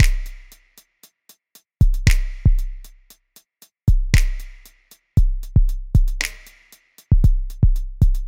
heavy thump drum line

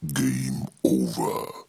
Game Over Evil

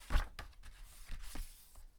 Turning Pages Of Book

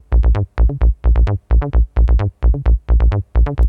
130 Bpm Hard Acid Bassline

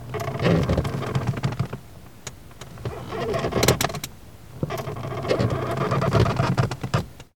Sitting Creaks

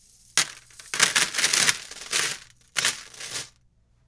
Metal Chain

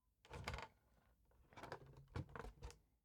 Wood Cracking

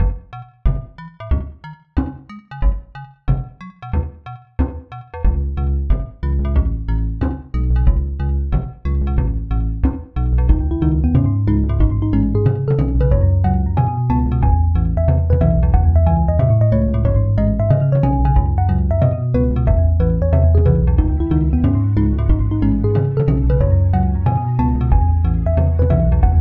End Credits Music

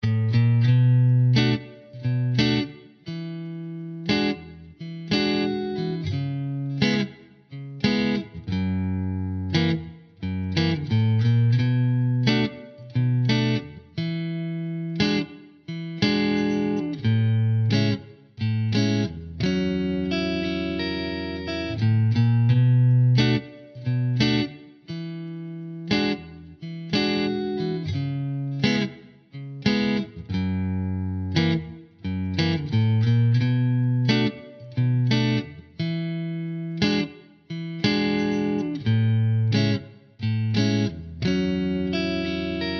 Clean Guitar

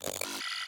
Glitch Sfx

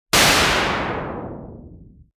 A Synthetic Bang

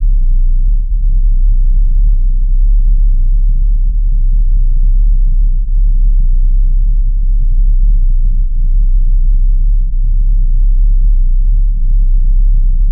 Ground Rumble Low And Wide

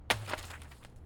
Notebook Drop Concrete

